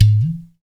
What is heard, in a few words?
UDU; Roland LCDP14 Africa VOL-2; Afro Menu 1